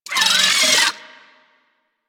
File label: Arachnoid Micro Vocal Attack; Warframe; Corpus